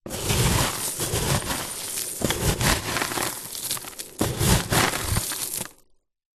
glass pieces thousands small broken wood dig in plastic tub; foley; zapsplat